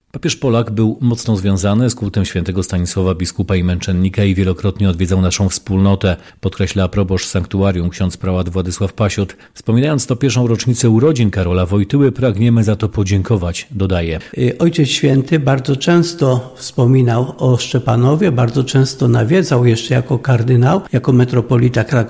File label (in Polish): KONCERT